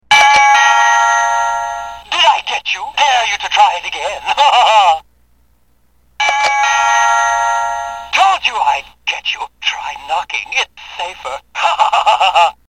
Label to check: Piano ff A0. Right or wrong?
Wrong